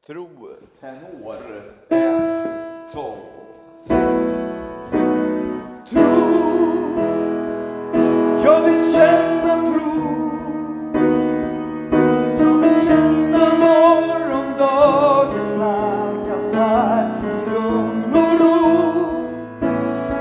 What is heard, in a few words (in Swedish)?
Tro Tenor & bas